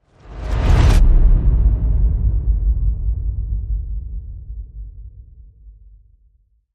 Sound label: Silent Threat Whoosh Boom